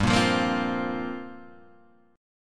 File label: C 7th Suspended 4th G